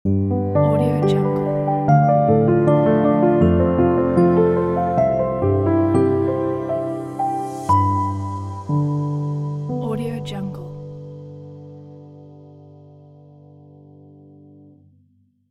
for logo sting; eliansproductions

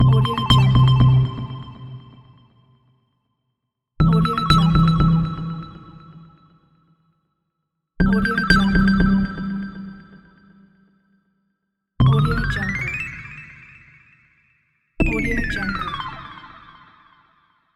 game drunk robots

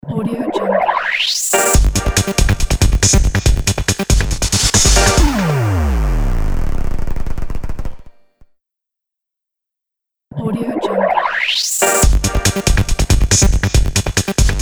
short glitch logo

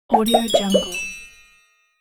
game over blunder

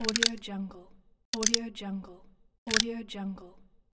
revolver pistol gun cock

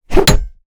hammer small whoosh impact nogore; soundmorph

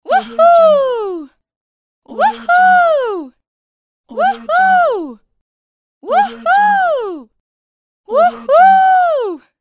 female voice woohoo

